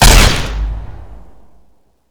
laser tribeam fire, laser rifle; weapons

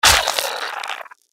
mirelurk death; npc

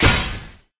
sword pickup

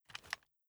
shoulder, weapons; mas scar; cw20 extras

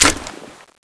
clip out; tfa cso; monkey wp set2

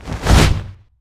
swing, rebar club; weapons; props dane

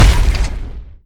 step; gundyr

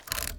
flamethrower reload

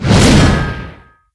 swing metal

